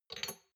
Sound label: shot glasses pull out soft; berufe; gastronomie; soundpool